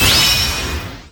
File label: Team Skill Tap